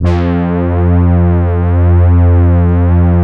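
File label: synthesizer, digital; Sound & Vision - Gigapack I CD 2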